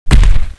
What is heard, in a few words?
heavy fall